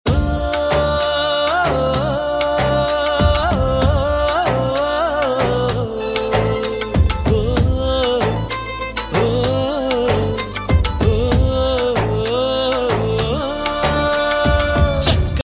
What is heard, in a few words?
telugu ringtone